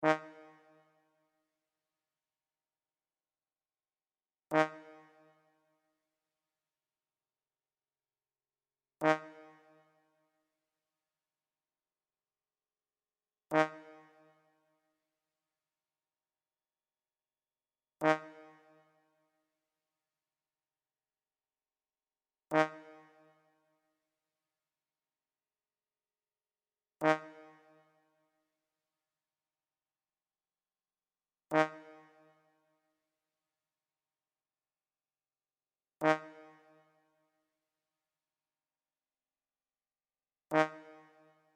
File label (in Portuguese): amostra de pulsos com periodicidade